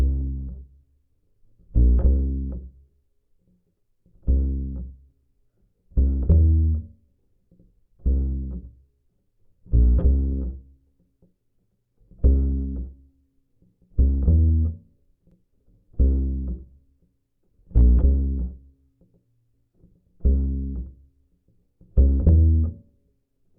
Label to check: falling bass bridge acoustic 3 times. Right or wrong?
Right